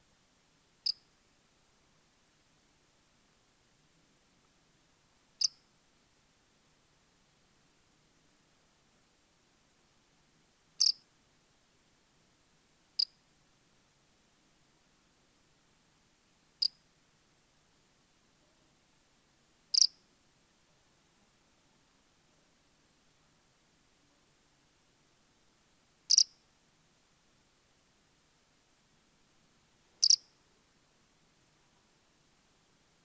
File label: Velarifictorus